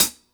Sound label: Closed Hats